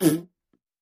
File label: Bowl Fart; farts